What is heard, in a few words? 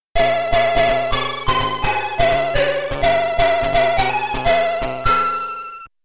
GOAL IN; Story of Bubble Bobble Vol. III; commodore 64